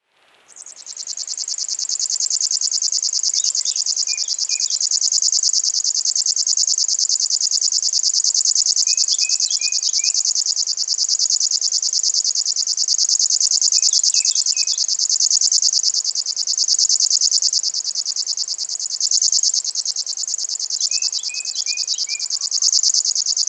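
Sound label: river warbler voice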